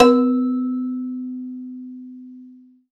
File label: Rubbery Piano, prepared piano; Total Piano